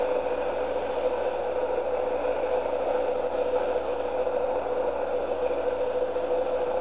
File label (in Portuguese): subindo